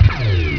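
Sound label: laser shot; Total Chaos Nukem 3d Pack; duke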